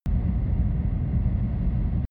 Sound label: drone spaceship rumble